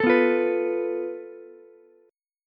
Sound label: A Suspended 2nd Sharp 5th, Ukulele; Asus2#5; strummed; JGuitar